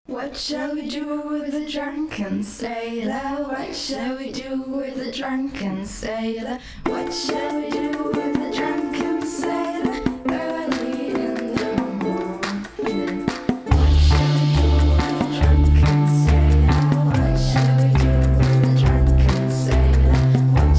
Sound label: Drunken Sailor Master Bass Version